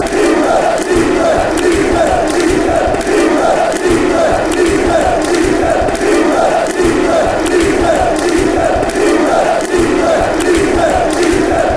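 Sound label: fanchants, football chants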